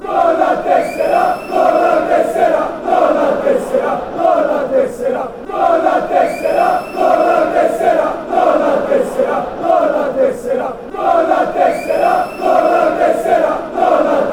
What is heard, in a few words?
id card fan chants